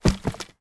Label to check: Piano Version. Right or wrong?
Wrong